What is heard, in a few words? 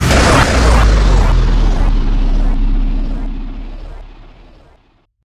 chronosphere; weapons; dota; faceless void